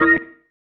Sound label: Stab One Shots, Organ